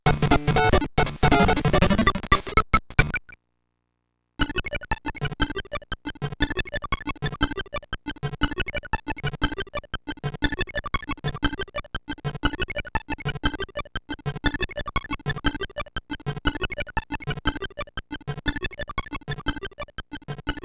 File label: Congratulations!; Kirby Star Stacker